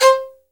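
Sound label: violin staccato C 4; AKAI S-Series CD-ROM Sound Library VOL-7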